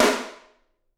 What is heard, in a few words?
SD, Drums; Bob Clearmountain Drums I